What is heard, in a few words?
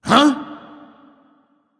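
nonverbal surprised; smite; voicepack; ullr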